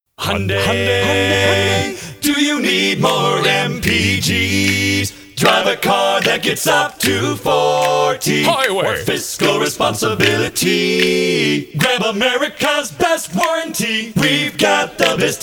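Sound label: Barbershop Quartet; acapella jingles; HyundaiDealers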